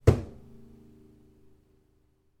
musical guitar nylon string bash against object or surface